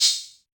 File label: Yamaha DJX 2B, HH52 T8 Metal Open